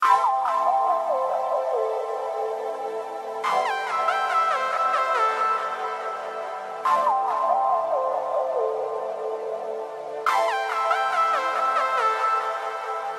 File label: cool trance ringtone